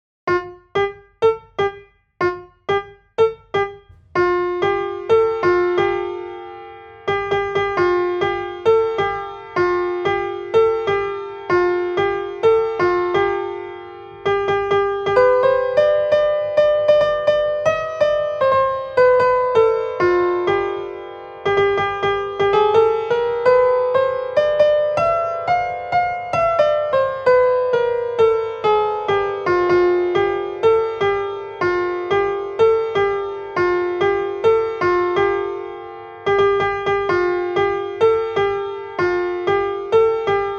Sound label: imperfect milestones piano